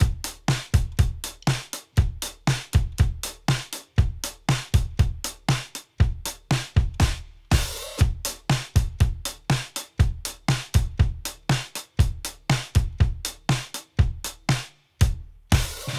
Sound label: Drums, 120 bpm; LOOPS; BASEMENT DRUMS VOL.1